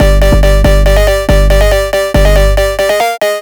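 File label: blurry vision phonk cowbell; phonk toolkit; rocket powered sound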